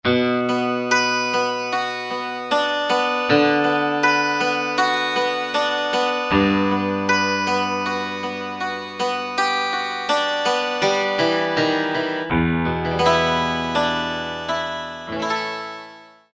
Yamaha TG500, FI DulcM

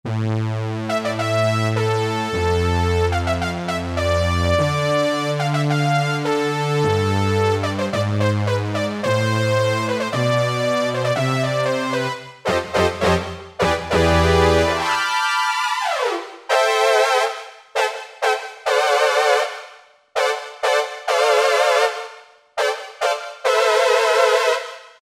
BR, Yamaha TG77; Factory Presets

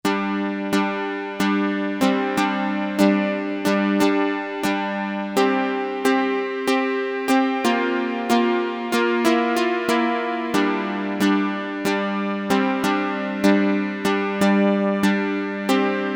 Synth Harp, Casio MT-600; Tones

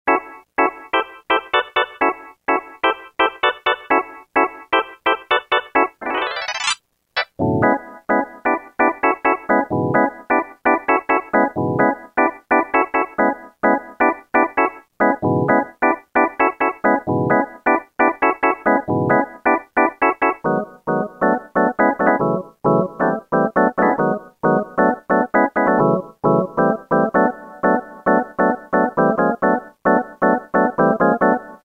Organ Hits; Roland M-DC1

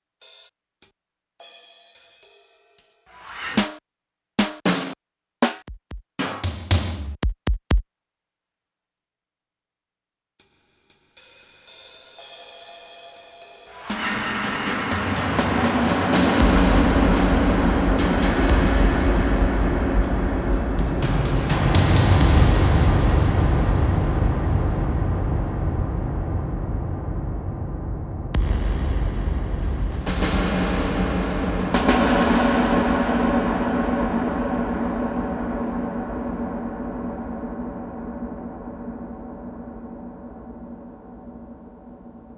20 Sec. EX. LARGE DARK, Alesis MidiVerb

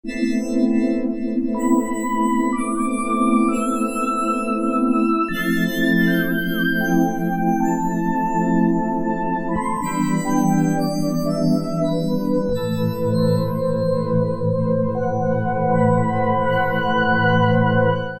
GHOSTLY VOICE, Casio VZ-10M; VZ ROM Card

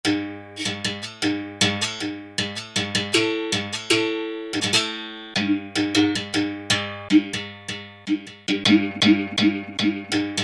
Berimbau; Yamaha A4000; World - Latin Instruments

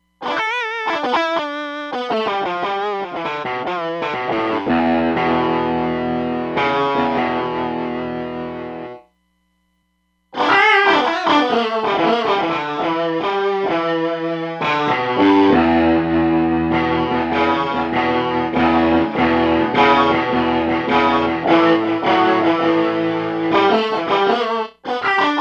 Vibrato & Echo, Roland DEP-5; Factory Patches

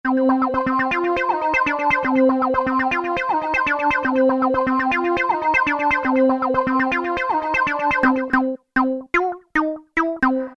Trance Loop; Alesis Rap Techno Dance

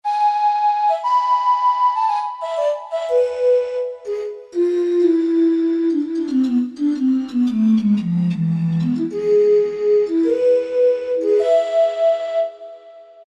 Bottles, Korg M1; Factory preset examples

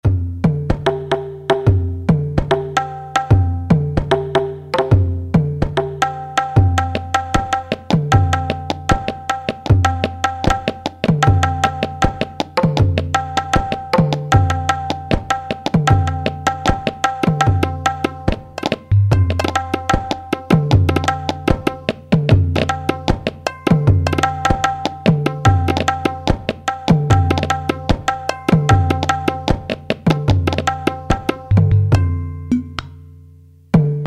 India Perc, Alesis QS8; Factory Preset examples

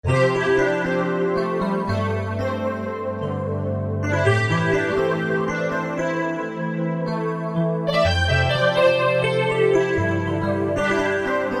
MIDI-STACK, Korg Fretted Insts; Fretted Insts 1

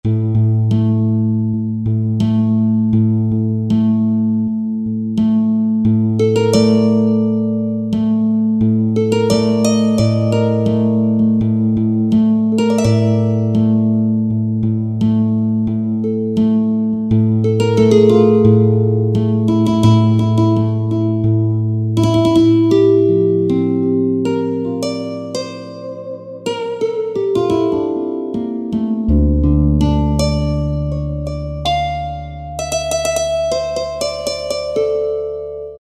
Et-Harptar, Yamaha EX5R; Factory presets

